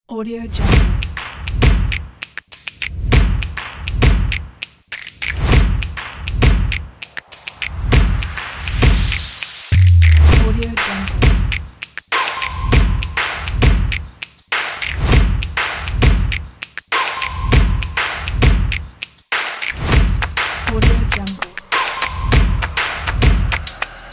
percussion; audioenergy

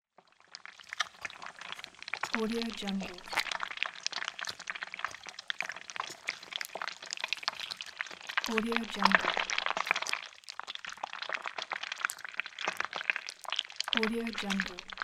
slimey worm crawling; applehillstudios

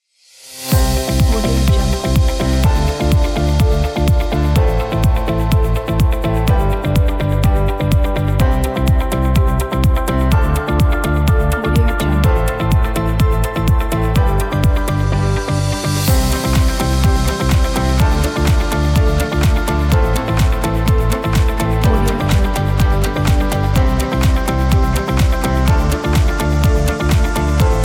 happy inspiring music

